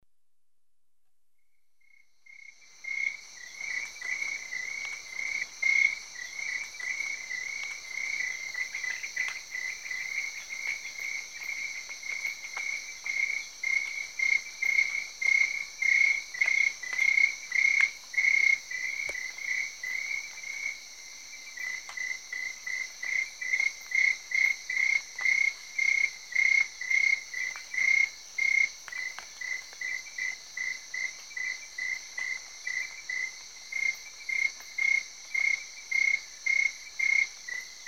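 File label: Crickets, tree frogs and rain on big leaves; The BBC Sound Effects Library; Africa- The Natural World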